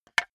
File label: wood board hit loose; objekte; holz